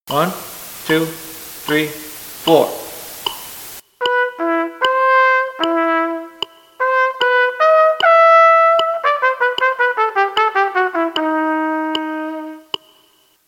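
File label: Play-along samples, quarter note = 76, trumpet; Cleopatra's Lament; third phrase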